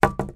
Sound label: industrial shovel wooden handle lean up on wall; zapsplat